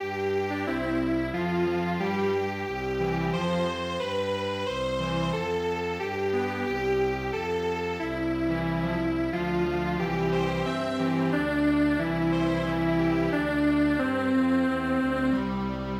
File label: lightning bug Strings Quartet